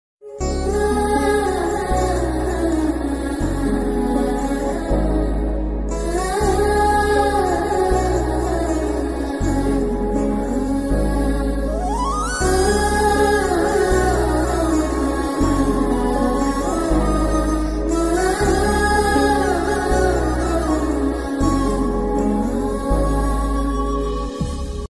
amma humming love bgm sad bgm ringtone romantic high beats; varisu